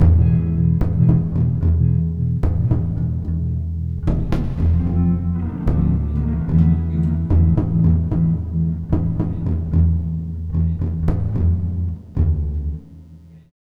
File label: Full Mix E, Lounge; Zero-G Kocktail Kollection; Construction Kitz (Lounge); Volcano at Sunrise 074